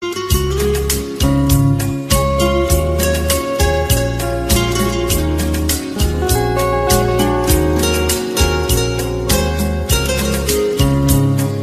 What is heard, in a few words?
i phone 6 plus ring tone new ringtone